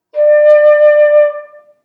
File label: Flute